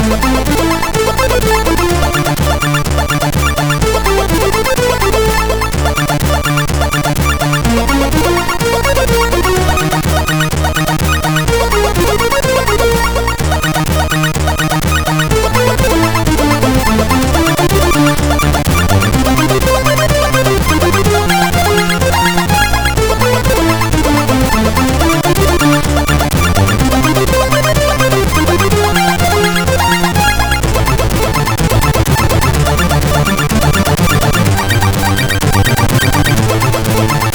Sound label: Loadmusic, sid MOS6581R4